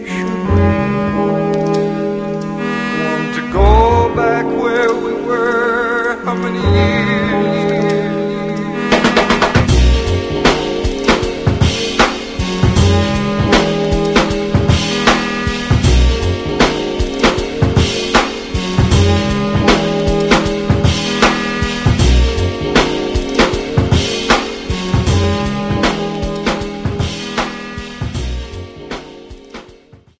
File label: Trip Hop Music